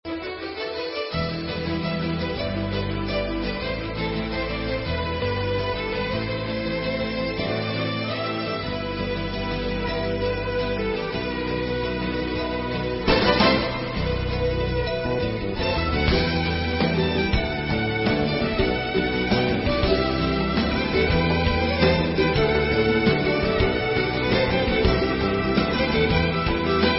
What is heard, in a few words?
Instrumental